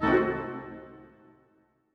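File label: orchestra; Masterbits - Switch FX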